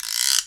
Guiro Long; Digital Sound Factory Virtuoso

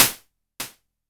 hat, Korg ER-1

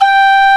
PENWHISTL G1; Proteus3

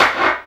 SD, Clap; Sound & Vision - Gigapack I; Snap

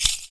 FOOT PERC; Univers Sons - Raricussions

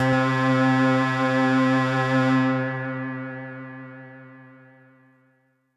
Synth C, Synth Prophet One Shots; Electronisounds

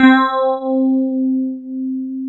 DS8 C 4, Korg; Back In Time Records; Korg Universe VOL-1

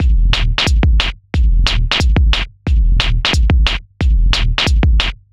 090bpm, Downbeat Dance Grooves

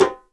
REPINIQUE; E-MU Producer Series Vol. 5 – 3-D Audio Collection; 3D Percussives; 3D Ethnics

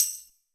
TAMBORINE; Greytsounds; Scott Peers Sound Engineering

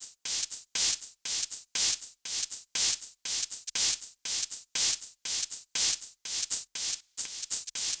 Hh Sh Loop 120; Equipped Music; Loungin House

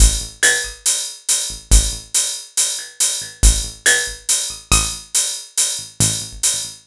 Percussive

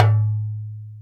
ALU DRUM; Best Service Advanced Media Trax Modern Composer

